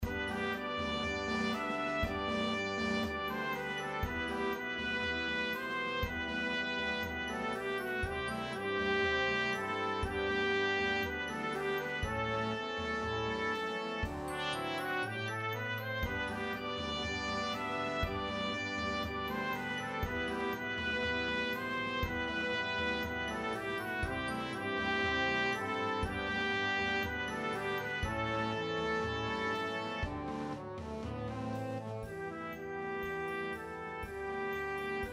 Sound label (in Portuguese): trompete c